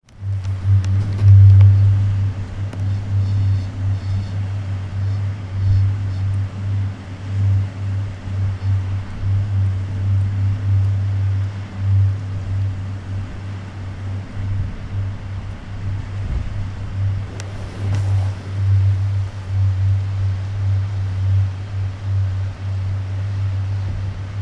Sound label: handrail sound